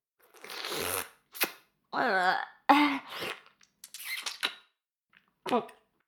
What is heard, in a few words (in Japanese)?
フェラ音声; 牧場戦腺; 少尉